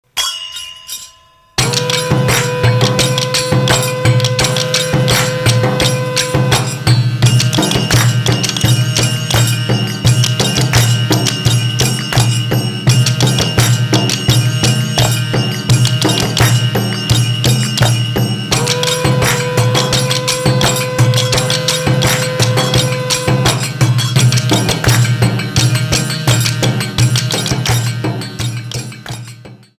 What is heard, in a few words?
Ensemble